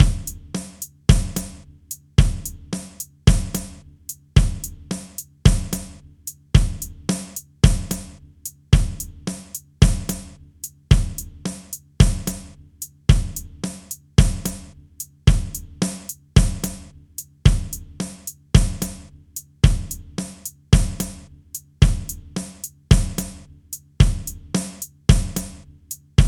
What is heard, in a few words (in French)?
Ré Train Batterie